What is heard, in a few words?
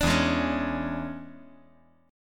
E 13th